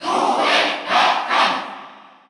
Cheer Russian; Duck Hunt; SSBU

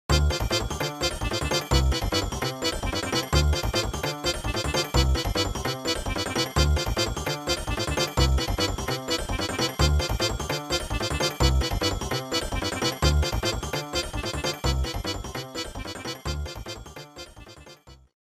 Starman Theme; Melee

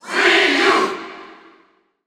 Cheer French; Ryu; SSB4

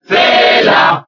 Cheer Spanish; Zelda; SSBB

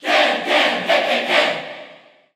Cheer English; Ken; SSBU

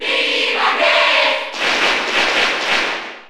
Cheer Spanish NTSC; Ness; SSBU

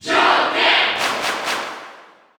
Cheer French; Joker; SSBU

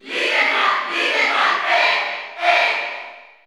Cheer Spanish; Little Mac; SSBU

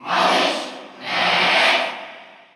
Cheer Russian; Little Mac; SSBU

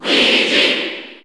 Cheer English PAL; Luigi; SSBU

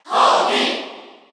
Cheer Korean; Kirby; SSBU

